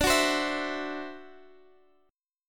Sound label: E 7th Suspended 2nd Sharp 5th